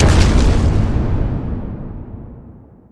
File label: hand attack; maya